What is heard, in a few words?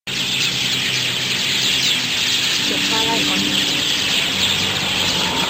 Starling